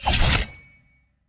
nimble fencing; eridan

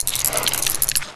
spider in; malicia

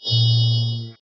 Level up

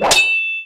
Soldiers Fighting

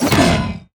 melee area; wukong bdk

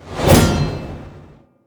attack

